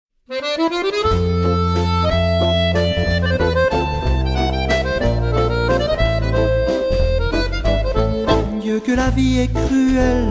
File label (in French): accordeo